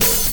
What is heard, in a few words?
natural open hi hat one shot g key